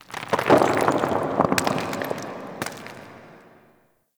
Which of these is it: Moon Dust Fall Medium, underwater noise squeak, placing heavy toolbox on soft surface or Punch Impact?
Moon Dust Fall Medium